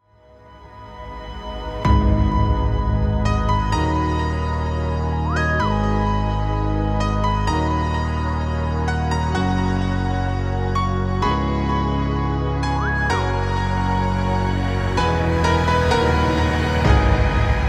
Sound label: Music Remix Sad